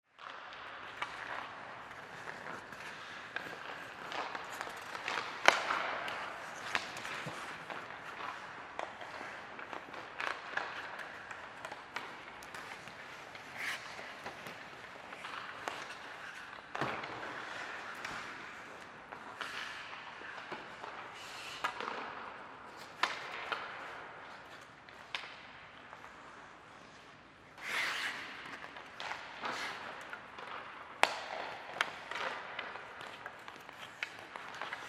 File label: Hockey Practice, Hockey Practice Indoor Arena Ambience; Hockey (Sound Effects:Sports); Blastwave